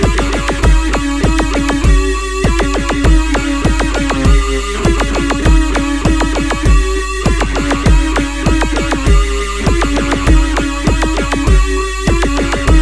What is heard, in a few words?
mars music; Alien Arena